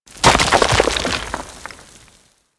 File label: Arachnoid Camper Step Rock Debris; Warframe; Corpus